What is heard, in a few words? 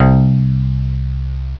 E Bass; Instrmnt; Sound Library 2000; Wayzata Technology